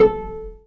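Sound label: cello pizzicato E; AKAI S-Series CD-ROM Sound Library VOL-2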